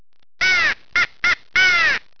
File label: sentry, crow